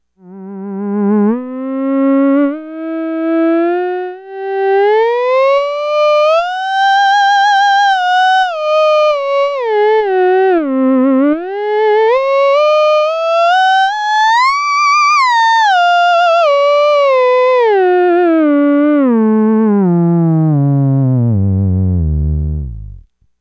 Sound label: ews new board, theremin; ews buffer exciter